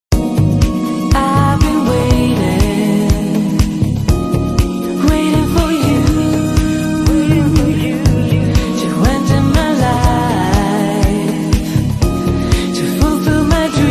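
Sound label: Nokia E50 Waiting, ringtone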